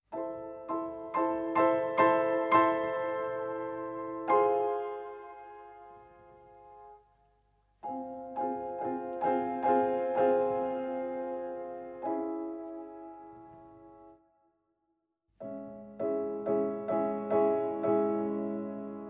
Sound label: Cello and Piano; Die Zelle in Nonnenwerth; Trio Dmitrij